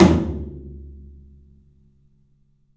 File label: PA TOM, 14 TOM; 3D Sound; 3D Drums; CLUB 14 TOM